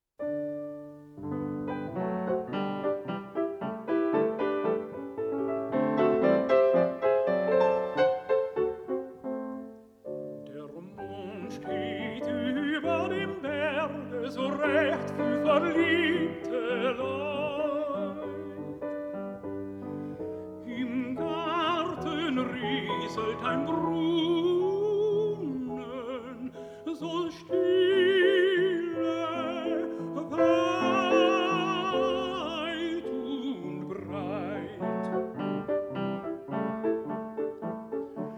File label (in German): Ständchen